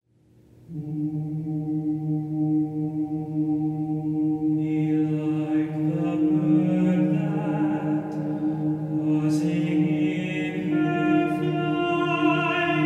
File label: cantus; Betinis